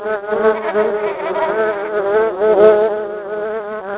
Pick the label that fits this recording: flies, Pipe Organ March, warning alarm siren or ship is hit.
flies